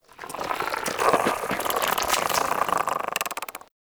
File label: Plant Smoke Stack Creak; Ambience; Props; Warframe; Venus Open World; Venus Landscape